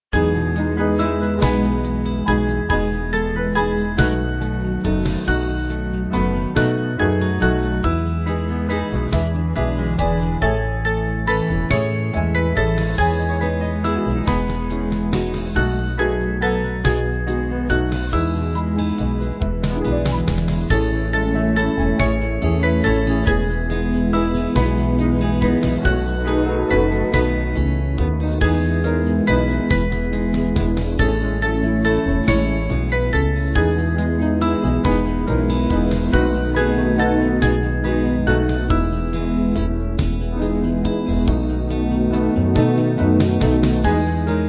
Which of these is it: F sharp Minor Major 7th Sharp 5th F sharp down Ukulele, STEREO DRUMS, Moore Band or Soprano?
Moore Band